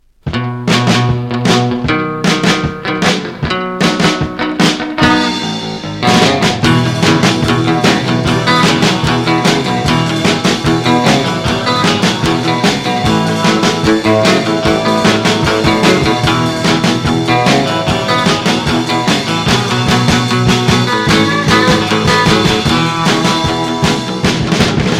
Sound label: crazy guitar